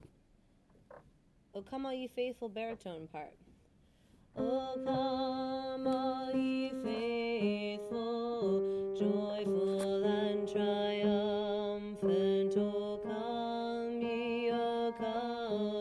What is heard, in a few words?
O Come Baritone